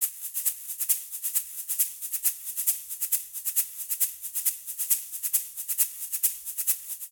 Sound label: Rhumba Shaker 135bpm; Latin Percussion; Ableton; Factory Packs